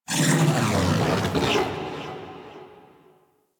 Sentient Lair Exterior Creature; Misc Creatures; Warframe; Ambience

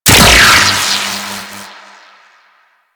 Chain Lightning Rifle Shot C 3D; Crp Chain Lightning Rifle

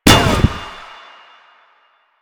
Shield Impact; Enemies; Lasrian Tank; Warframe

